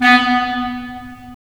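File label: WND CL, wind clarinet; LA Composer vol. 3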